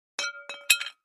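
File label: Large Tile Square Pieces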